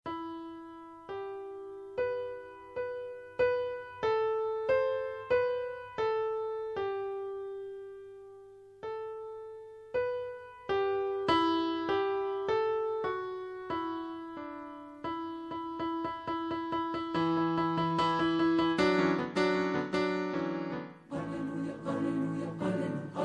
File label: Aleluia Aleluia